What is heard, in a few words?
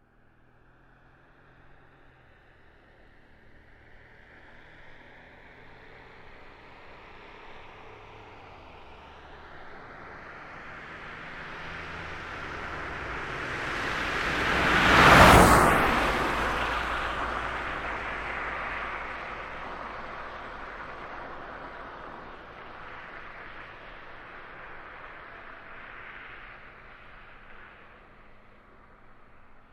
Car Smart Car By Fast; 2005 Smartcar Fortwo; Vehicles